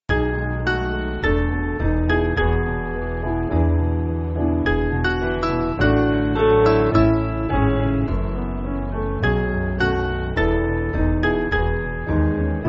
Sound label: Piano